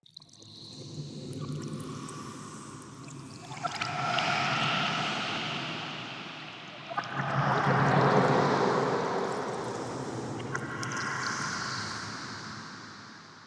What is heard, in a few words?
Trippy Breath; Ambience; Soul Plane